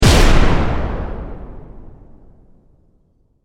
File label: Explosion Heavy; Explosions